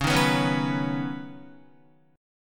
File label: Db 7th Suspended 2nd Suspended 4th, Guitar; 9,6,9,6,6,6; Db7sus2sus4 strummed